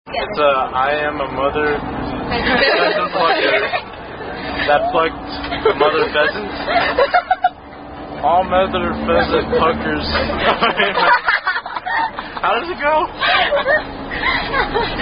Pheasant Plucker; Mother Pheasant Plucker

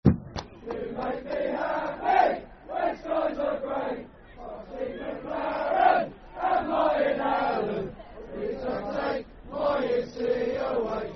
You Are My Football Chant (Soccer Song); You Are My Incey; Milton Keynes Dons Mkdfc

